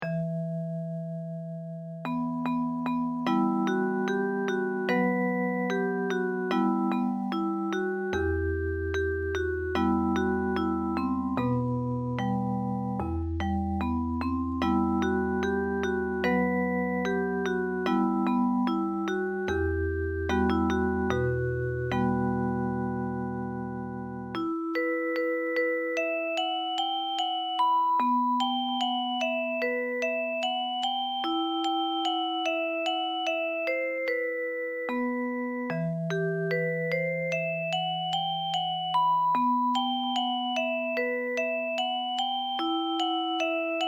japanese folk song; mukou anesama

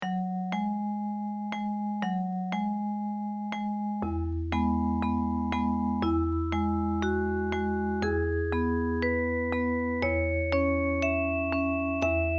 japanese folk song; netako kawaiya

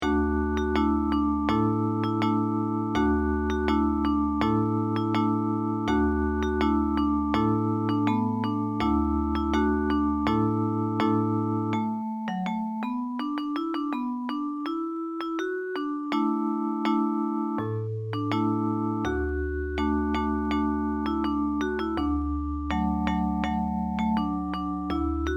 japanese folk song; chichibu ondo

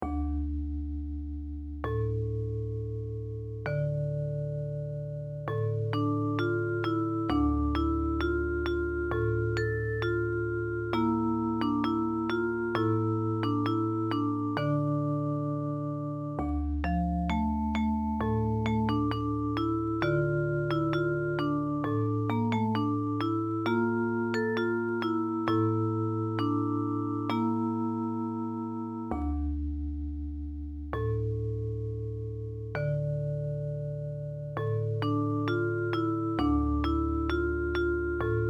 japanese folk song; aizu bandaisan

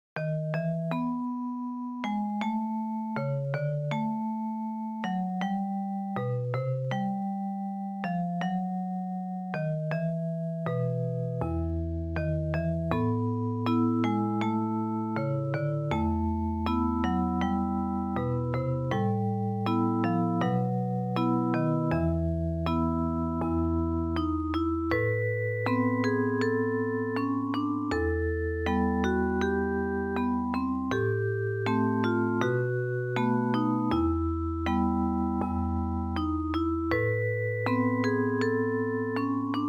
short melodies melody of far remembrance

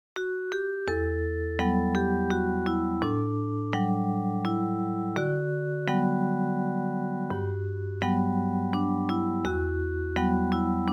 elegant waltz

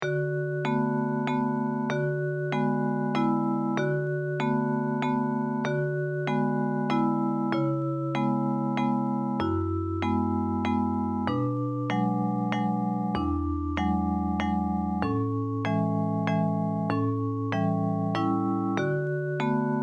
simple waltz

